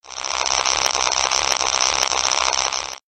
static

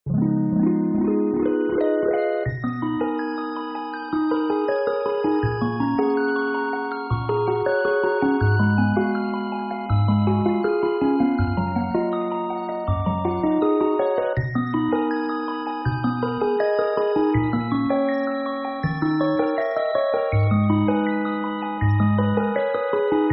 bg music fairy

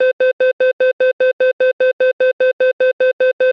close platform door

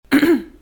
clears throat nervously

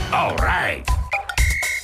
correct; wariowatch

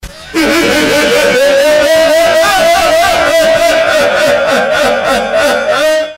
hehehahaha

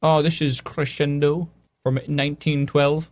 crescendo

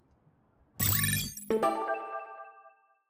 chirp

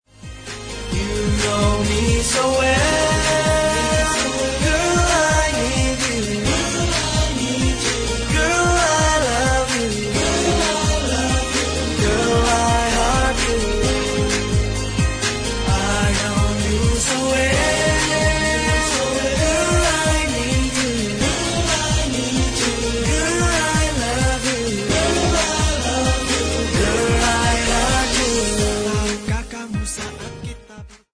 epic fail

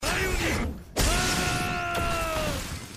bionic arm

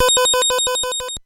nes 1 up; life force

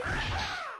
ninja sound effect

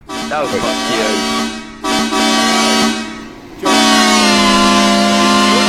crazy truck horn crash

